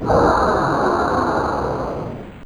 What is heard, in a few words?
large missile fire; weapon